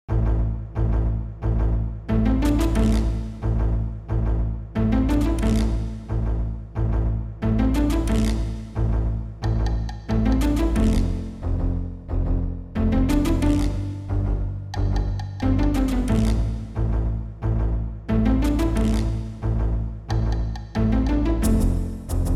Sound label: suspense